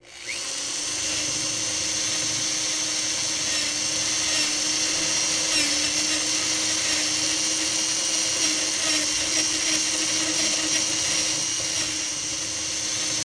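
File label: Electric Drill Drills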